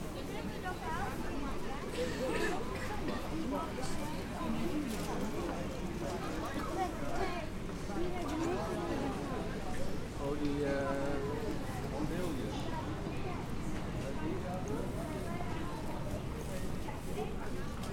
Pedestrian Area City Nl

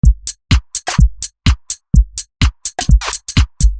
Talk Box

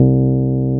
Rhodes bass C2

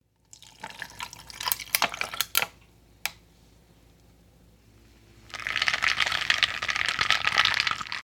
Pouring Milk

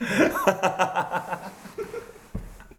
Guy laugh

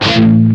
Dist Guitar Power A Pm

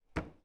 Foot

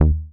Bass

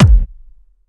Kick Trance Kick

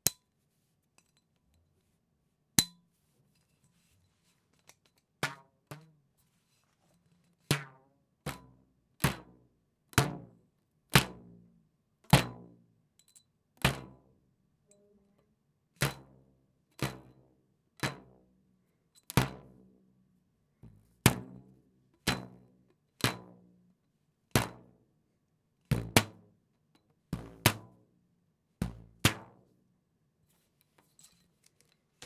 Elastic Band Silliness Comedy Bottle

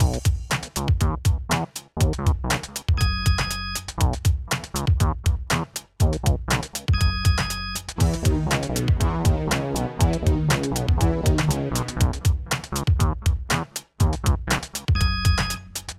Simpatico Loop

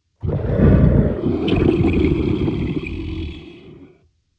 Tiger Creature Roar Fantasy Monster

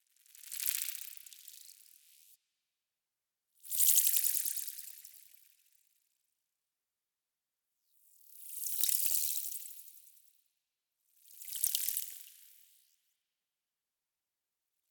Drippy

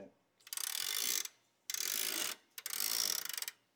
Gear Sound

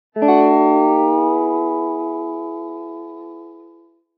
Slide Guitar

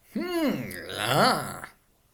Aroused

